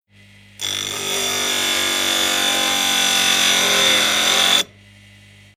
Industrial Bench Metal Grinder Run Long Substained Heavy Grinding; Grinder Sound Effects